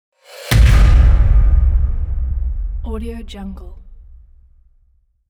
impact hit; annsomermy

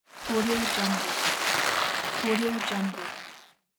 plant growing

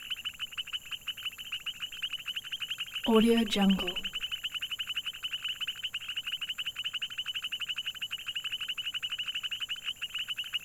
jungle frogs

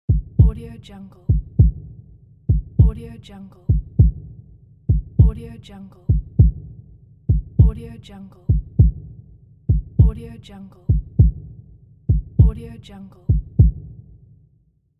heart beat

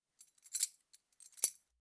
butterfly knife

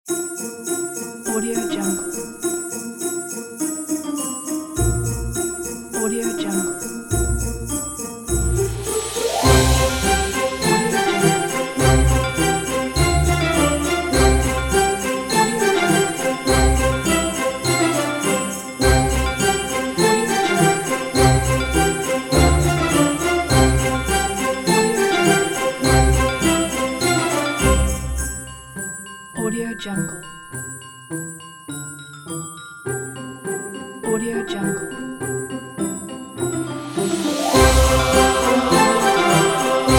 christmas cinematic; musicbackground-factory

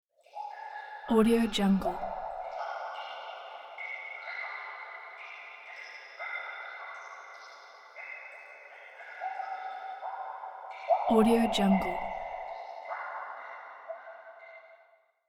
sewer cave drops; hollywoodedge

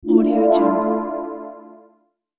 smooth dark ambient video game exit menu sound

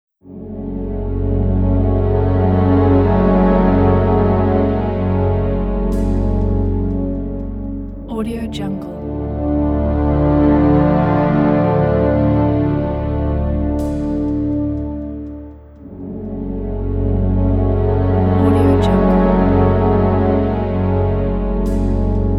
dark highlands closing titles; tracksonix

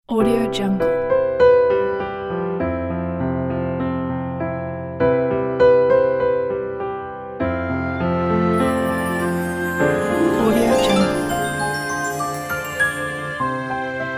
daydream ident; rhmusic

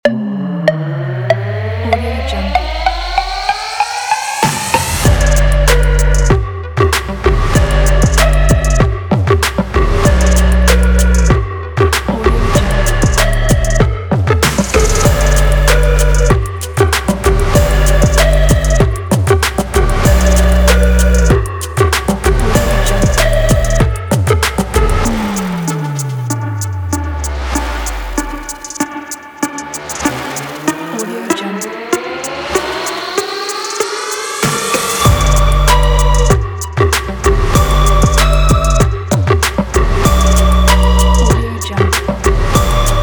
showreel opener sport

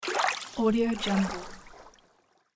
calm sea beach wave

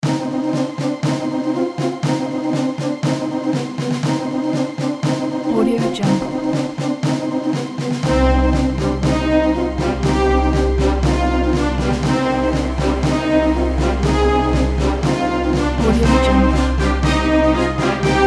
military assault

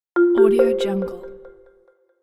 marimba ringtone

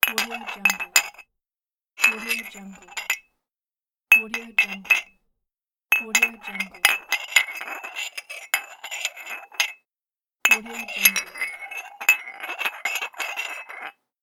mug clank

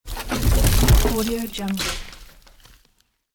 heavy thumping impact crash; sound-ideas

